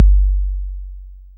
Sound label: Bassdrum